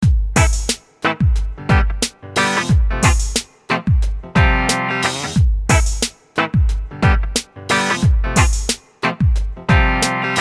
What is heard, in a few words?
depressing rock music